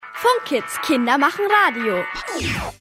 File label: Jingle Bumper